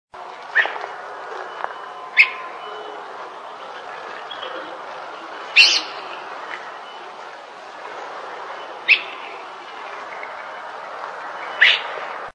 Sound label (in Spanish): zorzal chalchalero, voz